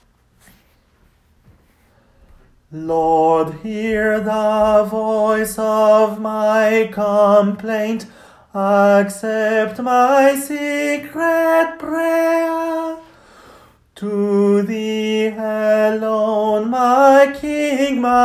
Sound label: tenor; lord hear the voice